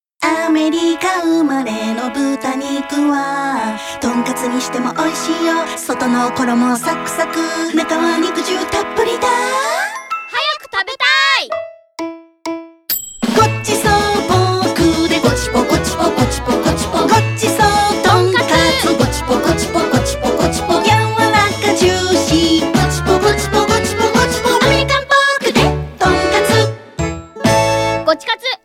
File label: tonkatsu song